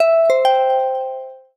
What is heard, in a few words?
Beckon, notifications; Honor Pad X7